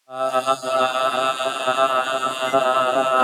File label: Low Note